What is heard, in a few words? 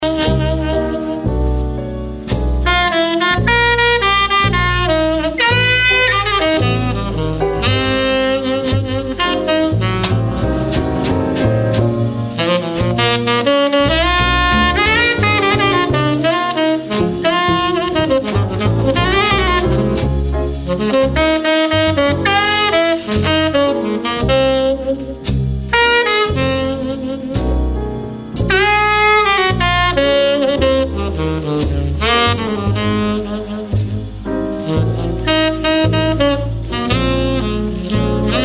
jazz